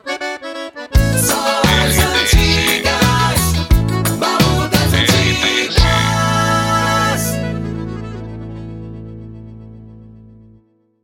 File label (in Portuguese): passagem baú das antigas; vinhetas cantadas para programas